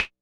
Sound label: Rim Sidestick, Drums; One Shots; Mad Skill